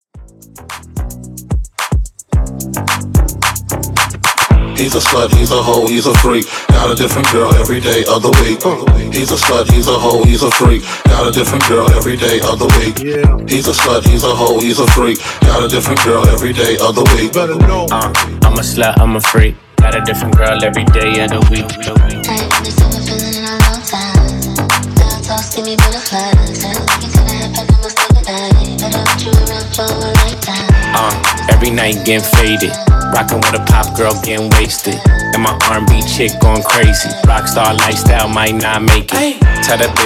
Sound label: Club Re Beat Hype Extended 110 BPM; remixplanet